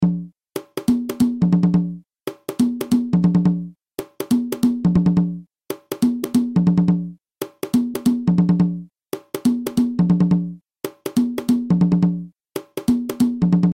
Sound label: guarapachangueo cajon